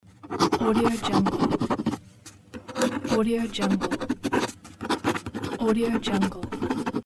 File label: writing on paper loop